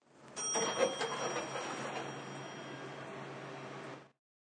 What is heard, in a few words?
Garage Elevator Open, Elevator dings open from parking garage; Elevator Doors